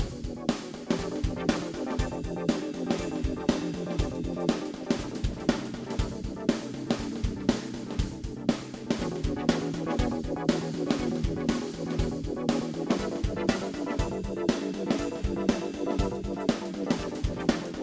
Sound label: drummerz